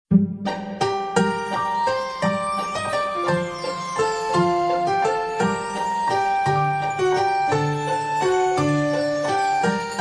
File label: green sleeves ringtone; ringtones